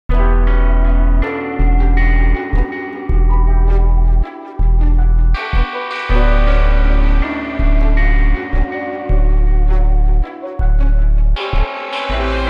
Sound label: TRIP HOP SAMPLE SONG RHYTHM OFF